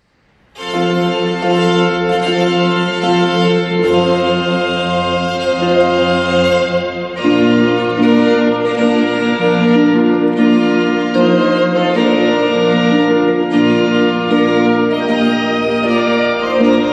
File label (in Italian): Marcia Nuziale Arpa Organo Violino & Violoncello; Wagner